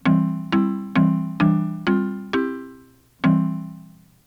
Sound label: ending tone